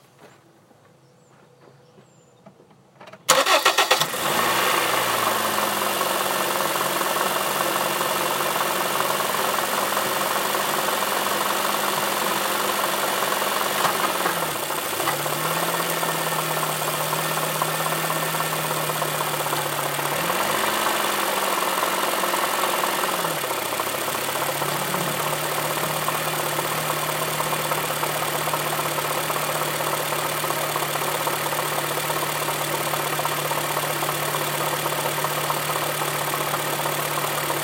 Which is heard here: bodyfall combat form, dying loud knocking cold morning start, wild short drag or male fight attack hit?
dying loud knocking cold morning start